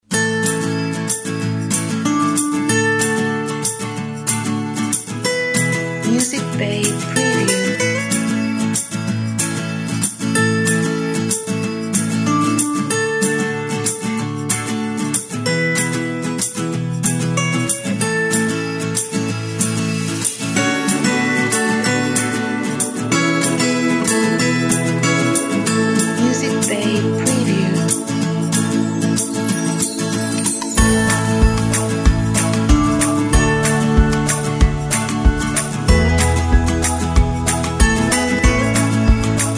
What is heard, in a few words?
Positive Acoustic